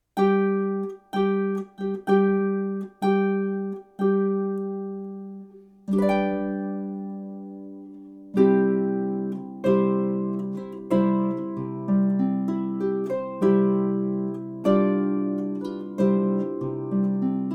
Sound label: bridal chorus